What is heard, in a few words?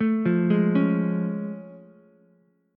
A Augmented Major 7th A, Ukulele; arpeggiated; JGuitar; 2,5,4,4